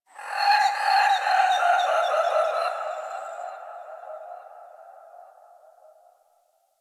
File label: Distant Zone One Creature; Warframe; Ambience; Eidolon Plains; One Offs